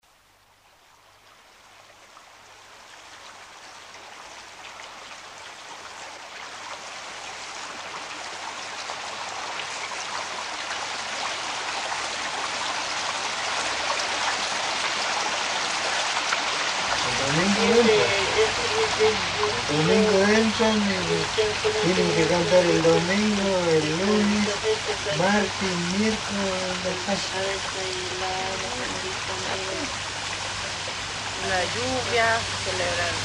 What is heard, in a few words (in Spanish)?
Entrada a Carnaval